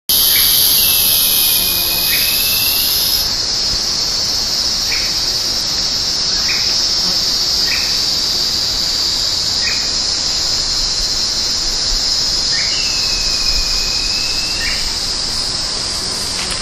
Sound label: Formicarius colma; PN da Serra do Itajai; Jorge La Grotteria